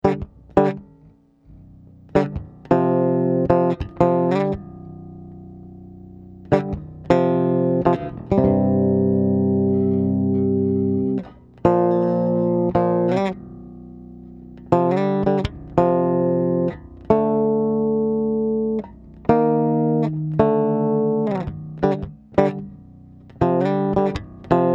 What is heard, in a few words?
chords